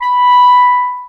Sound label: Alto Clarinet Portato B4; VarazdinOrchestral